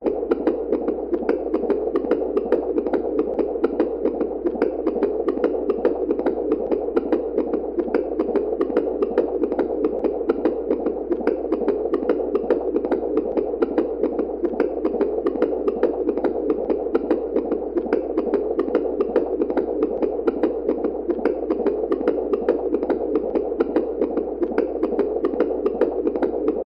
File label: human baby heart beat monitor